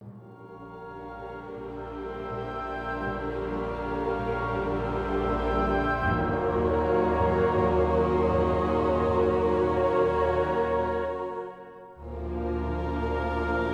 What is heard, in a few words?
castle music